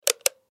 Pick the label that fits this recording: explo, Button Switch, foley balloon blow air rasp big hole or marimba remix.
Button Switch